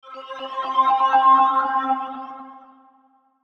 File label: Logo Pan Swell; Sonic Logo Sound Effects; Production Element Imaging Element Accent Transition